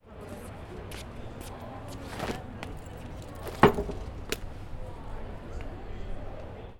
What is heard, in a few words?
Sit And Rest